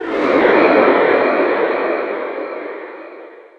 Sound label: dizzy on